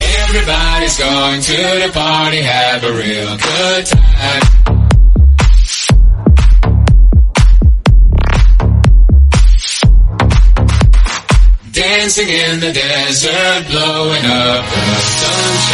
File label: bass boosted